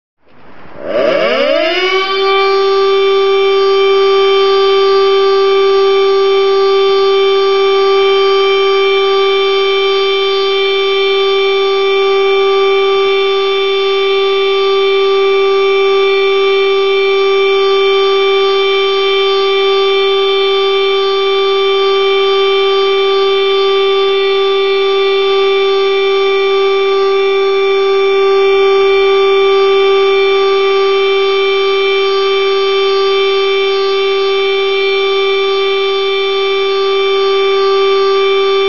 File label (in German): sirene zivilschutz warnung